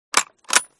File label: sv98 cycle